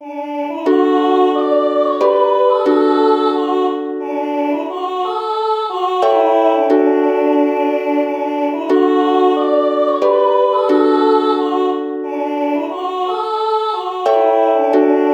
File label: UKE, ukulele